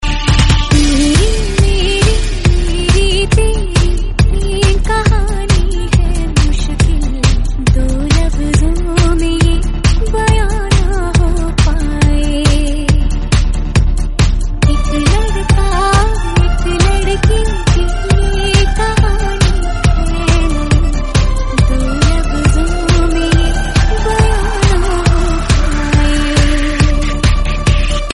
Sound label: Remix Female